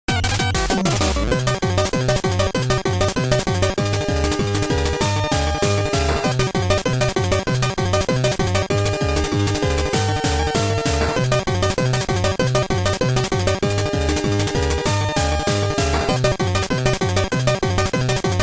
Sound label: shovel bottle